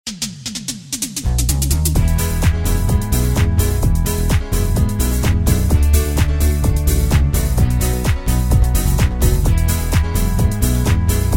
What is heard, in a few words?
music